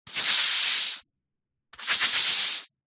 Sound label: household broom brush hard bristled sweep wet concrete ground long strokes x2